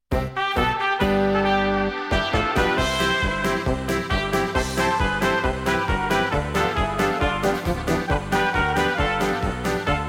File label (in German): Fruehschoppen Polka